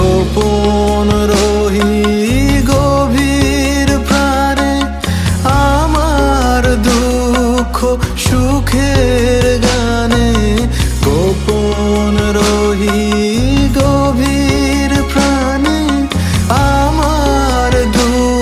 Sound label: rabindra sangeet ringtone